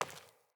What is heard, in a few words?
Sandals Walk Default; Player; Footsteps